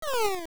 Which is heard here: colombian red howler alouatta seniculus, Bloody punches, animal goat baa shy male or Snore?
Snore